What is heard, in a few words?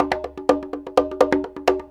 PERC LOOP ETHNO 125